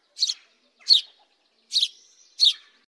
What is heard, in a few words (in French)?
moineau